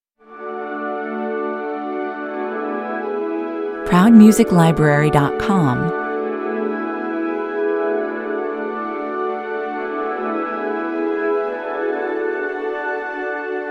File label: strings only